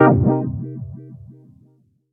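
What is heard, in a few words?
Cm7 chord; Princezz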